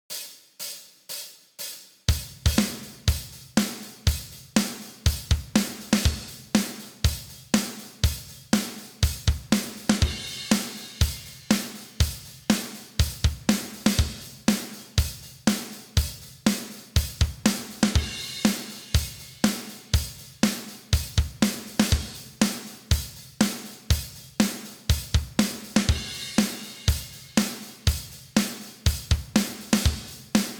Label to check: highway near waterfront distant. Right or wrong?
Wrong